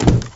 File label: Wooden Floor Medium, shagov